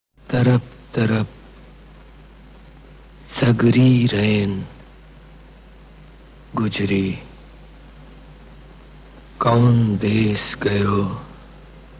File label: with Dialogues; shatranj ke khilari 1977